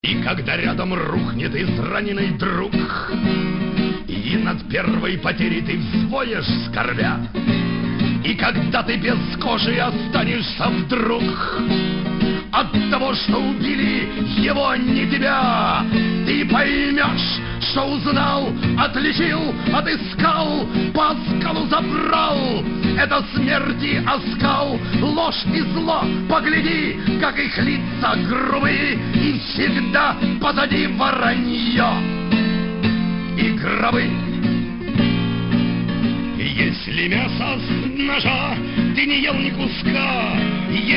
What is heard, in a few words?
Ballad